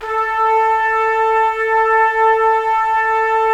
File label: Trumpets; Roland - LA Composer vol. 4